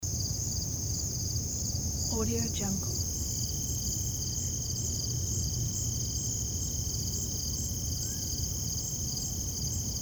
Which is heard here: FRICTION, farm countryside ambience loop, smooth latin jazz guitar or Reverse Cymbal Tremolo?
farm countryside ambience loop